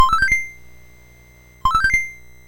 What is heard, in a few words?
BASIC sid MOS6581R4, GAMES; Sword of Fargoal